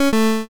cant buy

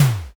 wall punch

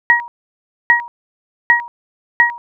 drip

